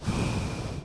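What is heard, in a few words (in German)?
MUNDHARM FX, Mundharmonika; Best Service; Alpine Volksmusik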